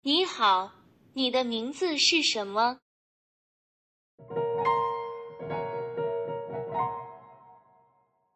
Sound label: chinese Voice And Piano Rendering